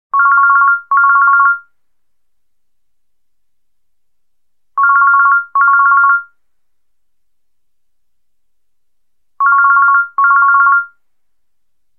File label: Old nokia phone tone